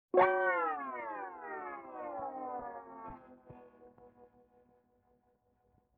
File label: Guitar Slide Down; Vintage Cartoon; Musical Elements